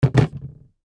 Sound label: Toolbox Set Down, Toolbox Set Down Plastic Empty; Hand Tools; INDUSTRY